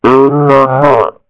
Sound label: Raccoon Hit